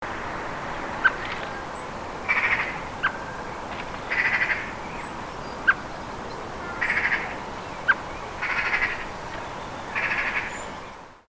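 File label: blackbird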